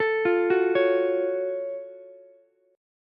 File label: Gb Minor Major 7th Gb down Ukulele; arpeggiated; JGuitar